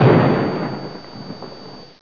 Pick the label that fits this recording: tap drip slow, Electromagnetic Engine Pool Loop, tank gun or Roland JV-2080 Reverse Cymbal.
tank gun